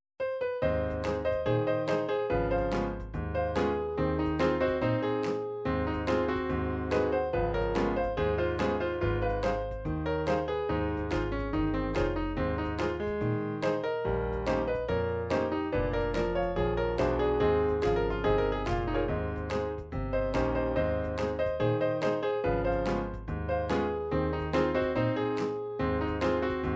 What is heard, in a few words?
jailhouse piano roll